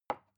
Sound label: glass put down; objekte